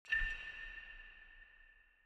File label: sword click; soundscrate